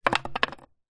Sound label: stick small drop on paving stone; foley; zapsplat